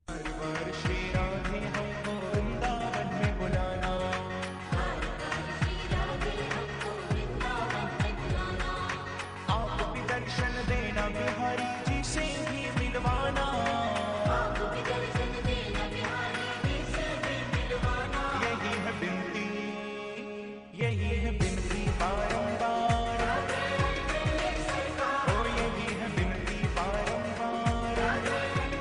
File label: Bhajan Ringtone